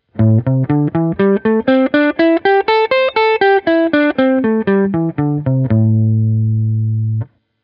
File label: blues, guitar